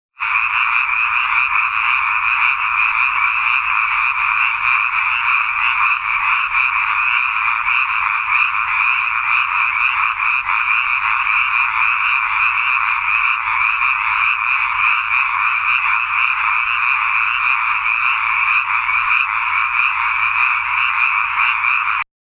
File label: Hyla japonica